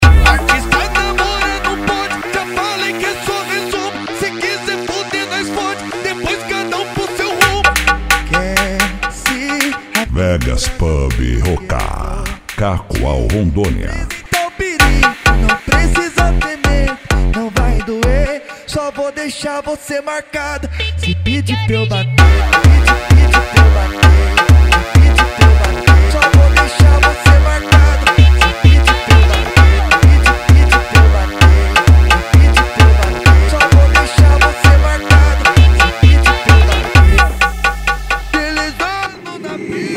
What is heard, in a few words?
funk mega bass